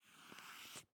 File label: ambience room shadow room working layer